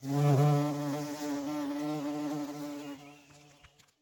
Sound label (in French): Bourdons beau massif ré; Vols Bourdn abeilles